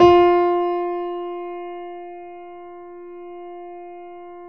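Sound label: grand piano F 3; USB Soundscan; AKAI; Keyboards Acoustic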